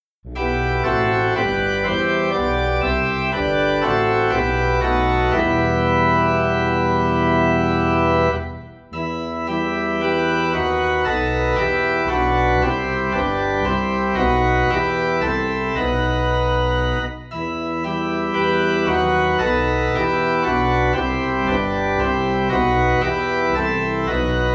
Organ in E Minor; christmas; God Rest Ye Merry Gentlemen

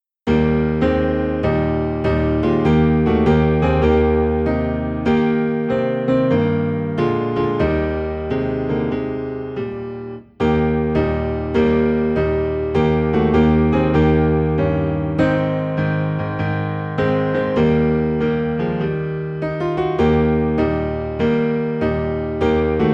Piano Low in E Flat

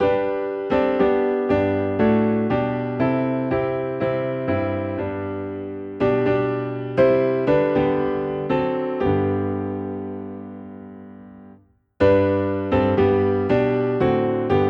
Piano in G; Softly and Tenderly

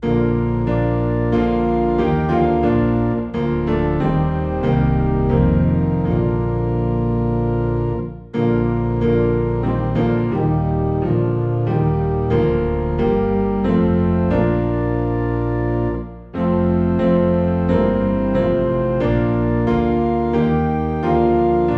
Piano and Organ Low in B Flat; Be Thou My Vision

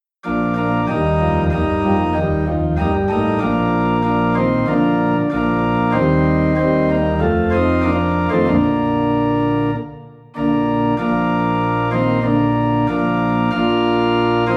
Organ Low in B Flat; At the Cross

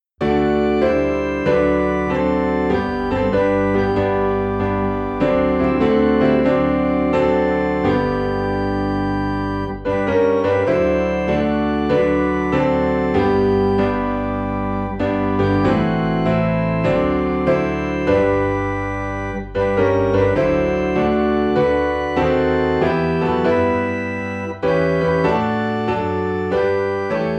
Piano and Organ in G; In My Heart There Rings a Melody

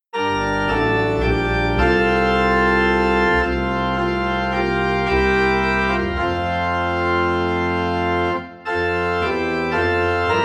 Organ in F; Be Still My Soul

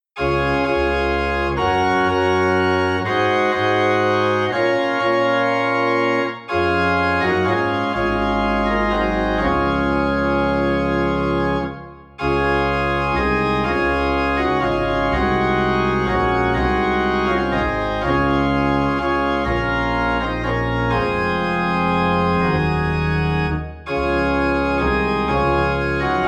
Organ in D Flat; In a Cave; christmas

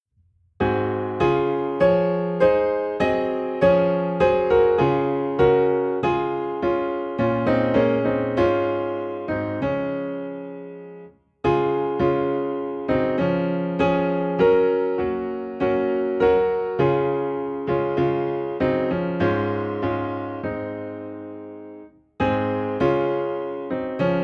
Piano in D Flat; He Leadeth Me

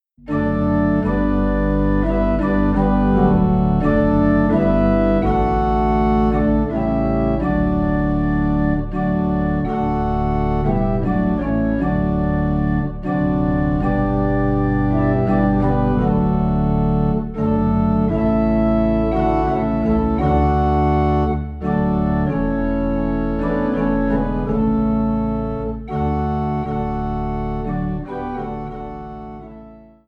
Organ Low in D

